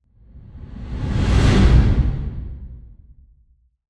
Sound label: Whoosh; Whooshes